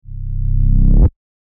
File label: 808 Reversed C